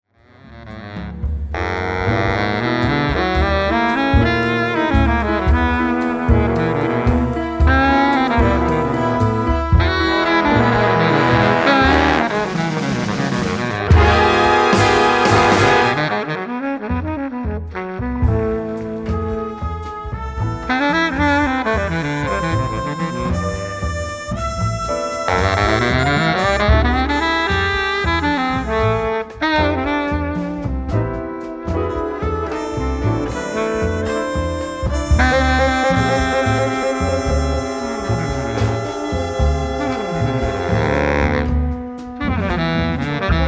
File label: Tango